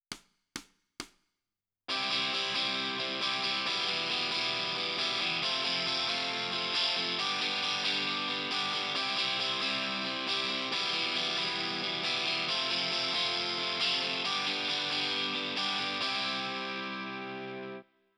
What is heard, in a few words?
Guitar Left